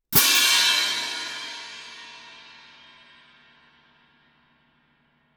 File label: orch crash germanic ff, Crash Cymbals; Percussion